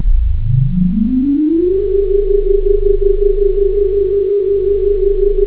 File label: alien, synth